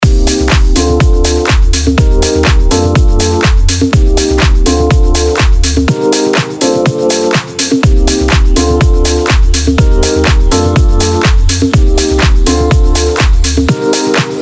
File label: Loops; Toolroom; Loops From The Crate Vol. 2